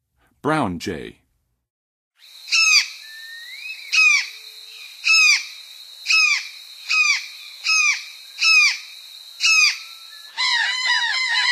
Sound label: Brown Jay, Western USA Songbirds; North America